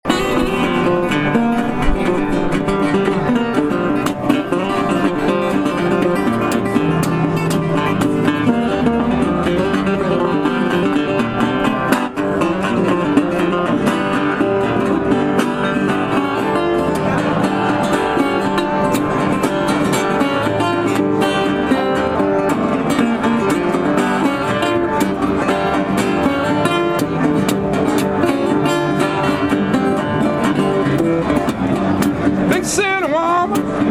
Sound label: Blues; National